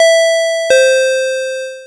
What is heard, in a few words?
bell